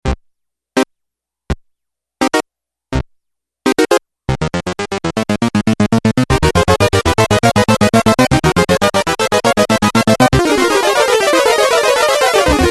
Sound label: poly seq, evolver